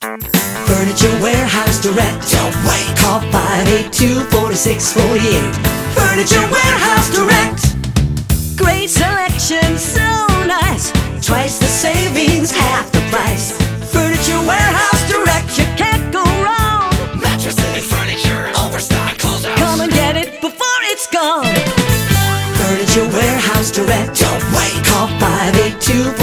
Furniture Warehouse; furniture store jingles

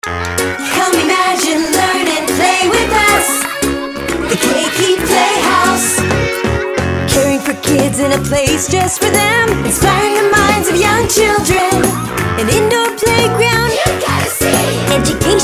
Playhouse Full Sing, children jingles; Keiki

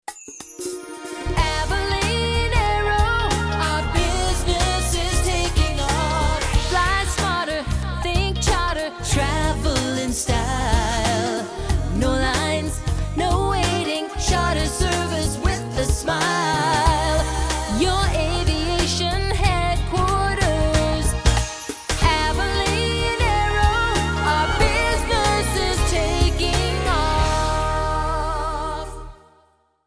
Full Sing, airline jingles; Abiline Aero

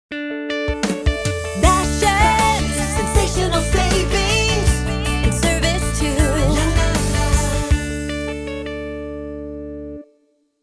Modern, grocery store jingles; Bashas

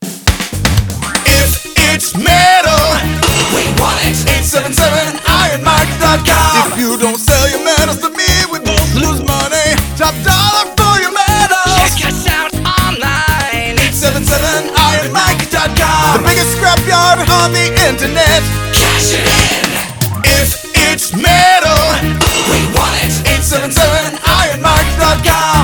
Recycling Full Sing, recycling company jingles; Iron Mike

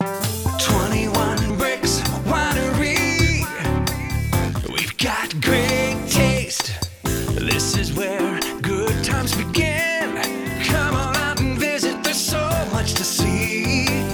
Full Sing, restaurant jingles; 21Brix

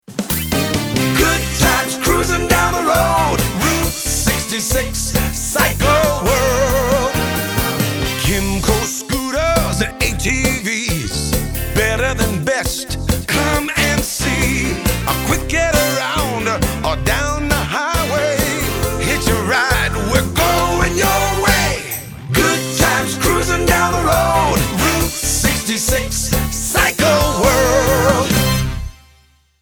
motorcycle jingles; ROUTE 66 CYCLE

